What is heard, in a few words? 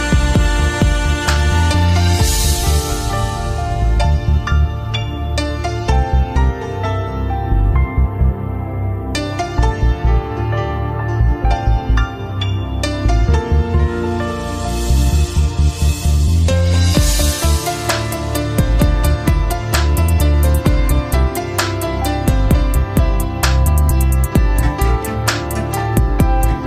nice piano